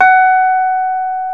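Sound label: piano Rhodes Mk.V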